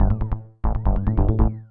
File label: SNTH BASS ELCTR 140 A, Techno